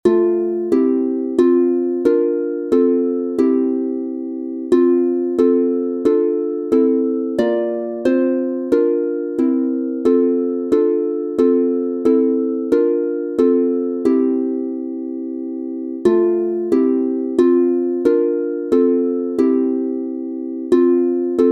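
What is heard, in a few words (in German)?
F Dur 3 stimmig instrumental, Harfe; Lieder Kirchenjahr; Der Mond ist aufgegangen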